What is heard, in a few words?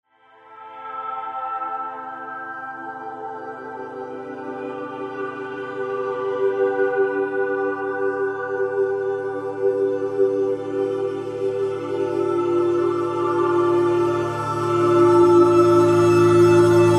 creative; minecraft